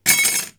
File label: Metal Piece Falling Small Rumour